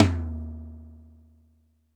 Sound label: RX5 tom; Crypton - DrumBase5000